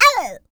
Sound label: gnome death